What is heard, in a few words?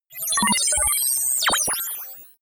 interface calculate